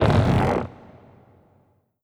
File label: strider stretch; creatures; Half Life Alyx